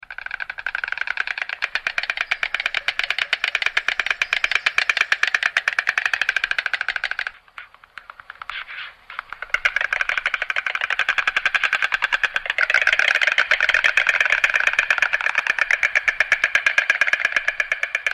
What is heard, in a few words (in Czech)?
zvuk, ptaci; jaro v prirode; nasi ptaci